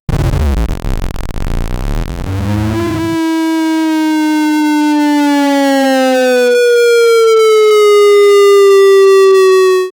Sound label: 8 bit game over sound; negative feedback